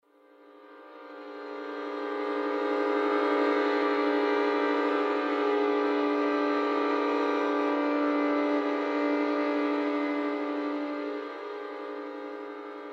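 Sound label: short ambience; crysis warhead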